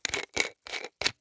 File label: screw bottle cap